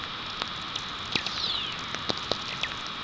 whistler, VLF

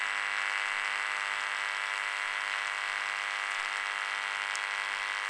balloon, VLF

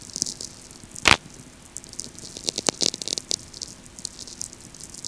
VLF; St Michel

